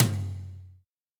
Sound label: hi tom drum one shot g key; tom drum samples